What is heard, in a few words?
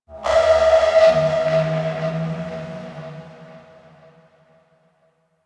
Ship Energy Texture Large; Ambience; Warframe; Corpus Ship Remaster